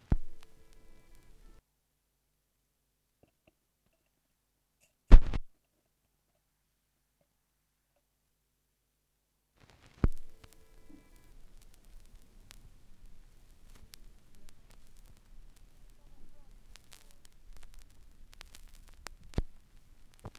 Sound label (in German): schallplattengeraeusche